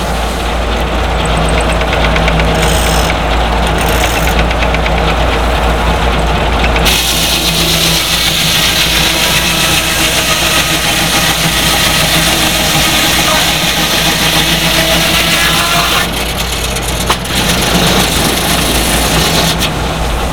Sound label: sawmill; sasquatch